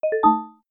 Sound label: error